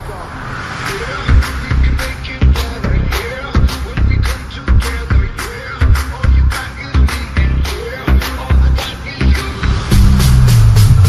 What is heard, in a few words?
Saw